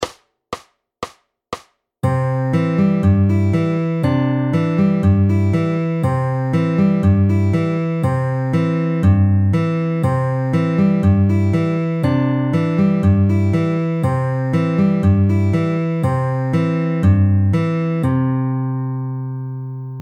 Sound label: In Betweens FAST, fingerpicking